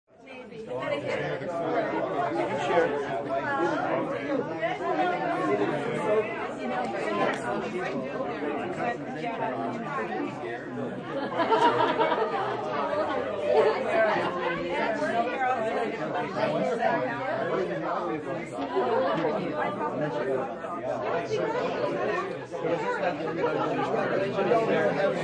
Ambience Friends, Gathering, live ambience, group of 20-30 adults talking, intimate and close up; (stereo); Crowd; (human voice)